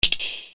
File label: Percussion, EuroDance